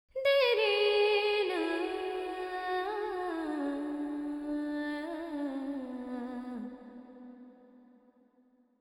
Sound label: FX 4-4 D 100, Vocals Female; Sample Banks